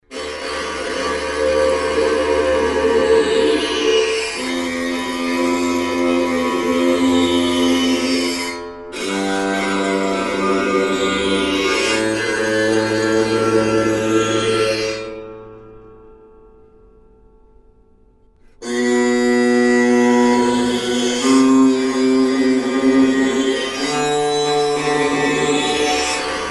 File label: stroked zith